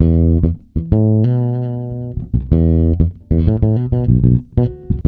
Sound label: E, funk, bass; Spectrasonics; Bass Legends